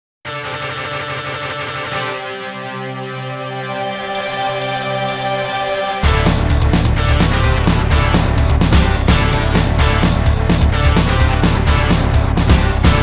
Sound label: FAKE METAL